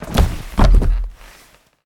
Jump Fail; Game rip; MiSide

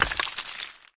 Figure Drop; Game rip; MiSide

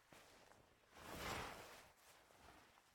Player Awake; MiSide

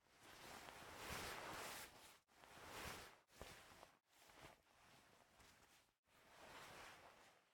Player Door First Open; MiSide

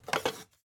Picture Take; MiSide

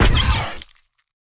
Enemy Death; Game rip; MiSide

